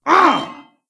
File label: Wound; Stormcaller Brundir